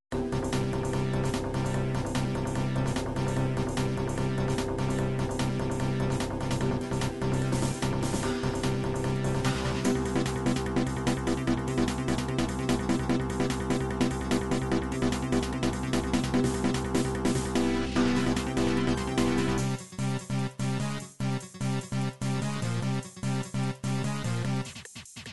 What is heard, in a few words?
techno